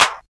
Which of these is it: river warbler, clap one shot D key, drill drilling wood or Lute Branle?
clap one shot D key